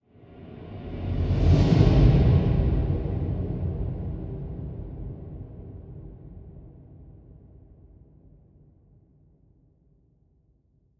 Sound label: Low Swoosh